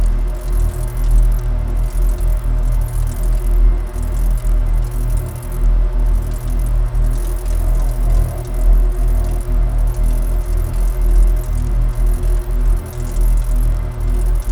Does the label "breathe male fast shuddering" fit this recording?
No